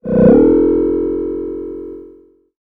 engine; message tones

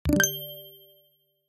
accomplished, notification sounds

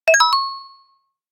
did it

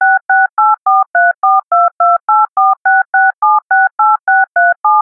dialing sound dtmf

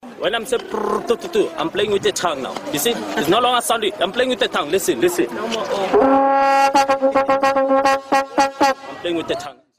playing with the tongue, vuvuzela; ringtone

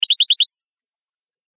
wake up tones; oringz pack nine

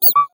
machine poo; game sounds

